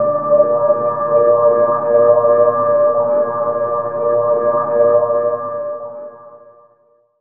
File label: syn d, Chillout Synth Atmos